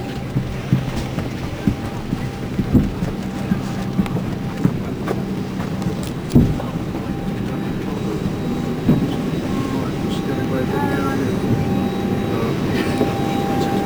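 Environment interior exterior exiting plane to tarmac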